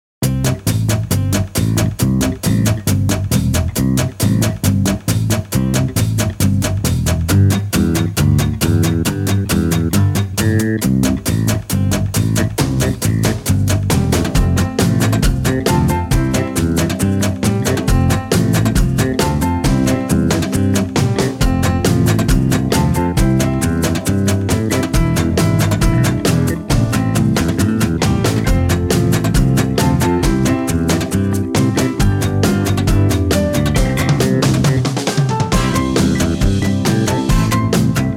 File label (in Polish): wersja karaoke